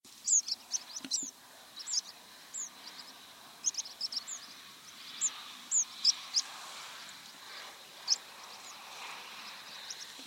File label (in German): Waldpieper Flugrufe